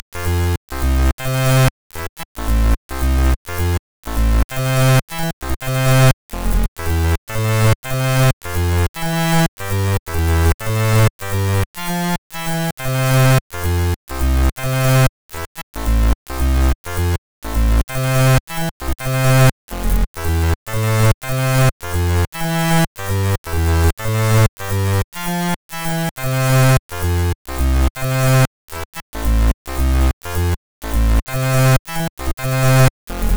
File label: Error; ゆめ2っき